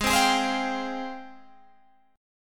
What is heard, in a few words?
G sharp Major 13th, Guitar, Standard; G#M13 strummed